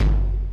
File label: High tom